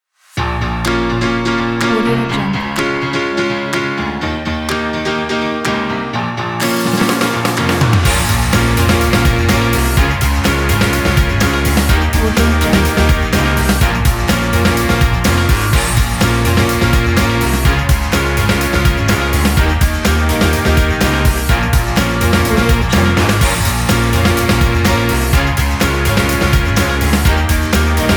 energetic pop promo

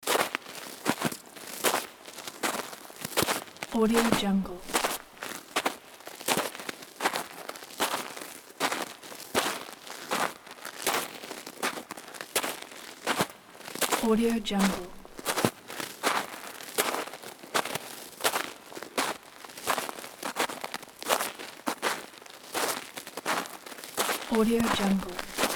cave walking

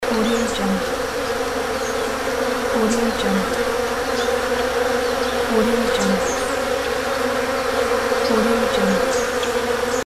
bees

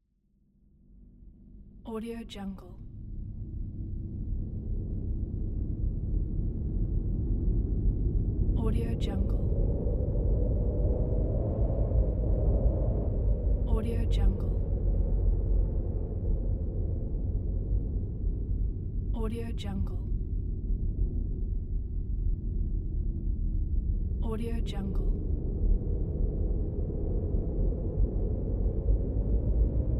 ambiance

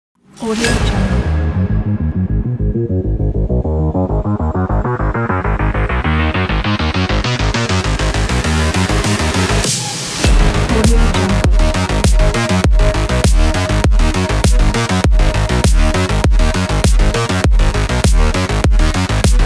technology robot drive

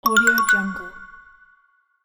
menu opened

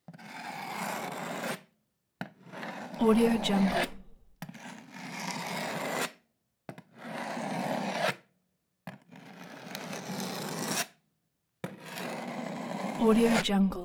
scrape wall scissors blades against plaster

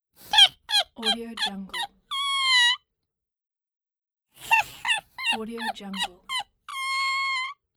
cartoon laugh

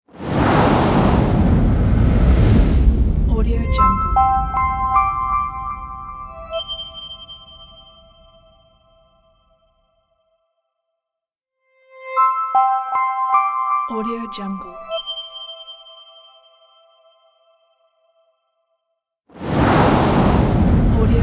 simple minimal logo

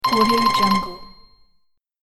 musical warning; sonic-boom

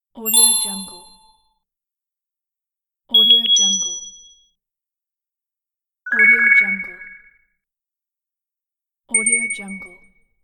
twinkle; audioefx

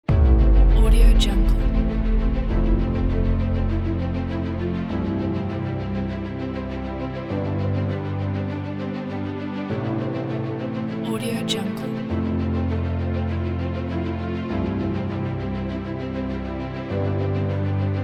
inspiring cinematic epic orchestra

